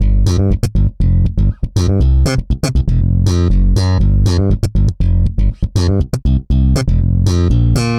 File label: Bass African Funk Gm 120bpm; Guitar and Bass; Ableton; Factory Packs